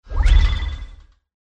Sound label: Notification Control Points; TankiOnline